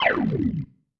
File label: Slide, Organ, Loops